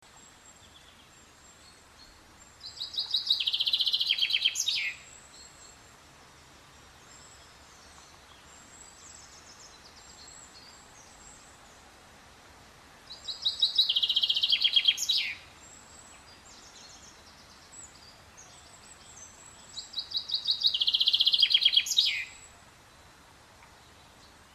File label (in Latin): Fringilla coelebs; SETE